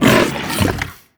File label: pull grunt, barnacle creatures; Half Life Alyx